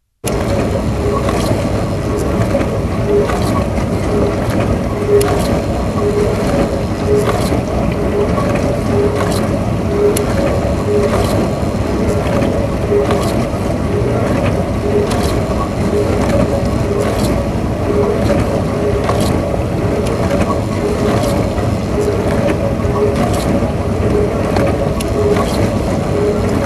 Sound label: WASHING MACHINE